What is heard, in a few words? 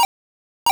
Encore